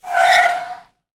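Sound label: heavy car brake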